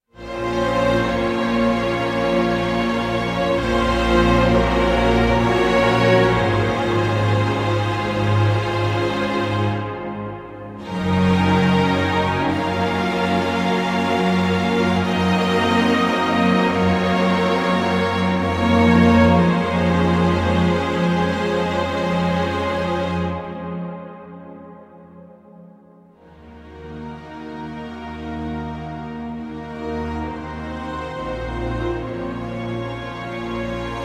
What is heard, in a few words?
Strings